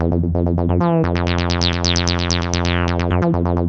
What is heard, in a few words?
F2, synth line; 90 s Sample CDs; NiceBeats; SynthLine VOL-3